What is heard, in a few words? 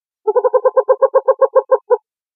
pac screech